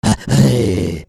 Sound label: CARTOON